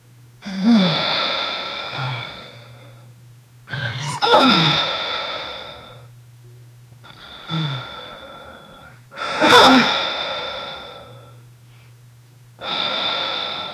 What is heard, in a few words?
years old man holding, close mouth